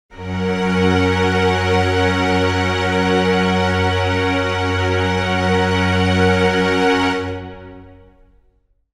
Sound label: string chord f sharp major